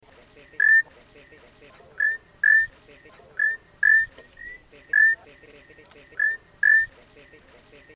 eleutherodactylus patriciae valle nuevo dominican republic; Hispaniolan cordillera frog; Its call is a short, rising trill; Central Dominican Republic, Hispaniola; Courtesy of Mr. Marcos Rodriguez; West Indian